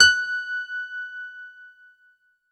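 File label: F#5 DANCE, ANALOG SEQ; Roland JV 2080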